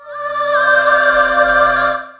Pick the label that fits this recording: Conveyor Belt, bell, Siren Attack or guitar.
Siren Attack